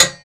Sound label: FX metal; Sound & Vision - Gigapack II